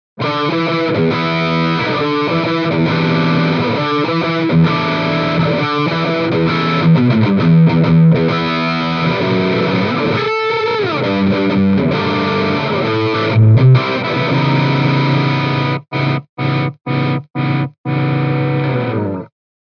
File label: Celestion Greenback G12M Distortion Les Paul